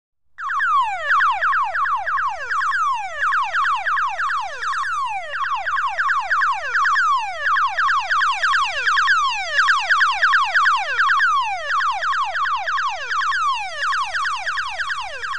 Alarms, Electronic Alarm; BBC Sound Effects Library; Explosions Guns Alarms

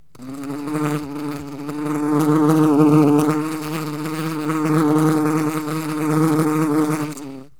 Bumblebee, Close, Flying Around; Alexey Sinitsyn; Attack of the Bumblebee

